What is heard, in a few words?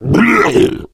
male zombie growl; player; voice; source engine; left 4 dead 2; boomer